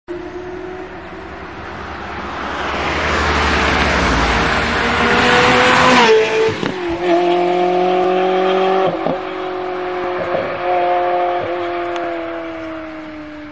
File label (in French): Ferrari 360 passage